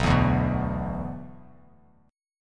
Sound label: B-Minor Double Flat 5th B down, Bass Standard; Bmbb5 strummed; JGuitar